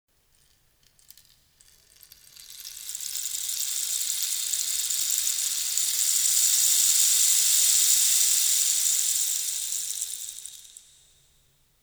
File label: rainstick; julie ben semmane